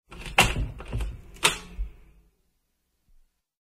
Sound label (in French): Serrure ouverture Bruitage